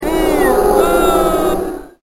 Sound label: BOO